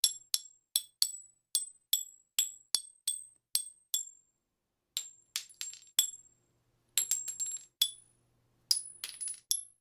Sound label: shell casings handgun